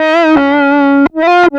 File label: 075 E-Coast HipHop Backw Guitar Bbm